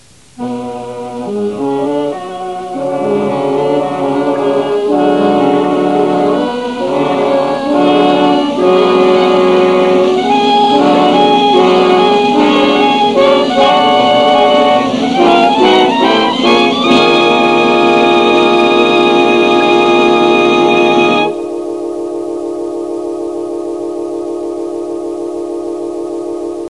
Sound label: dramatic